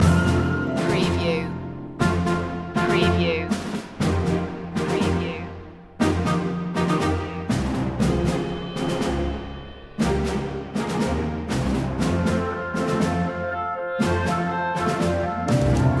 the long march home loop

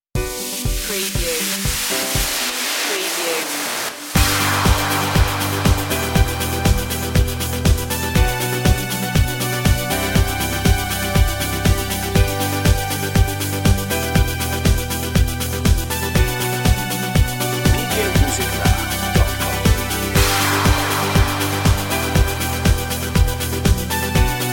rise above

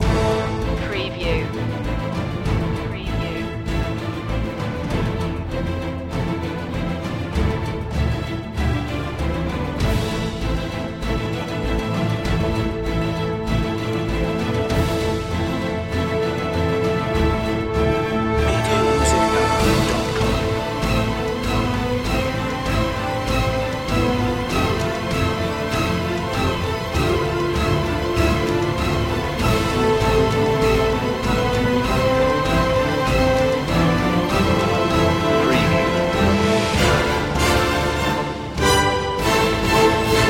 no choir

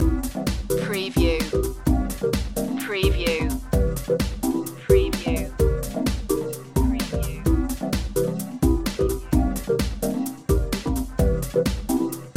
wheels of steel loop

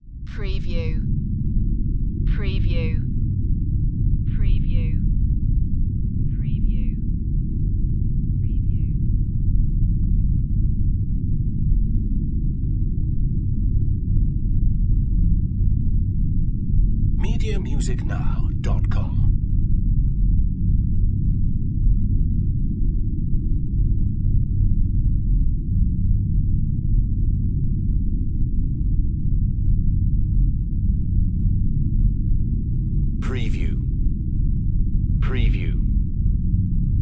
Electrical Power; Sound Effects Bible

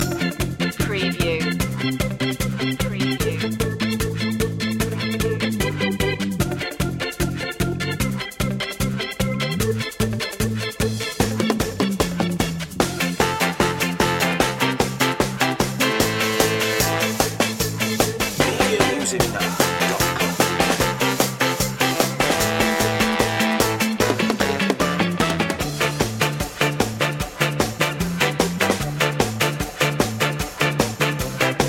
ska

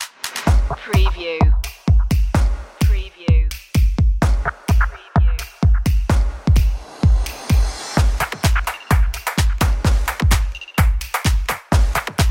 action energy drums

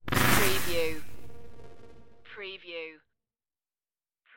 tech glitch sparkle

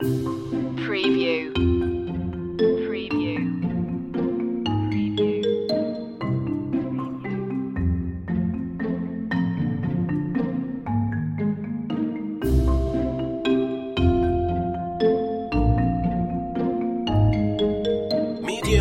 a lovely dance loop

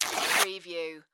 Cloth Rip Fabric Tear

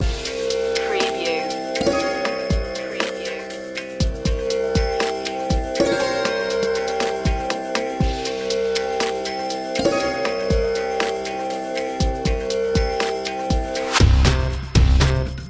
bollywood pop loop

